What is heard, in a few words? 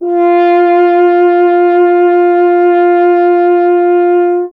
F. Horn